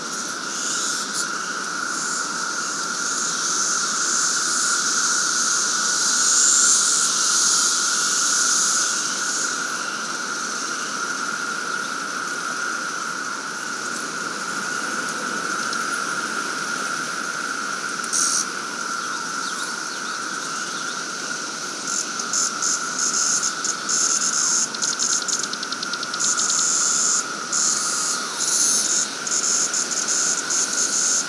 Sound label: Hickory Ridge Pond Cicadas; Cicada Bloom